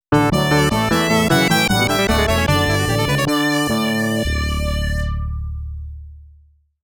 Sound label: Fanfaare